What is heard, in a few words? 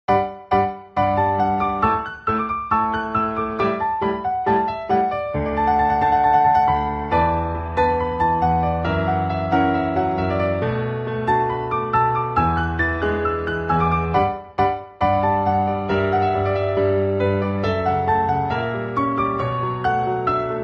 piano